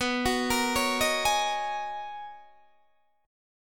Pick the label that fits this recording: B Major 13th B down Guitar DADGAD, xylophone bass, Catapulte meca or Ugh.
B Major 13th B down Guitar DADGAD